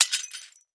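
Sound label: Tile Debris Hit Hammer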